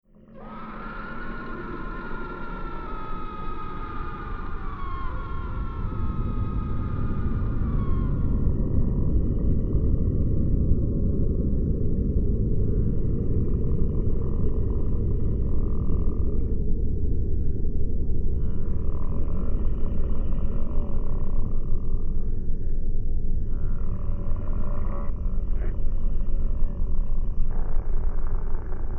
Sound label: Meditation Music